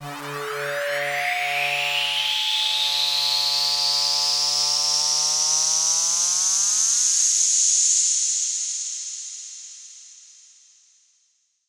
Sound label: Force Riser